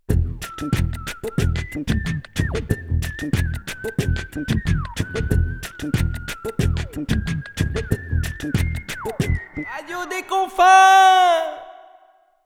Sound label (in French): jingle court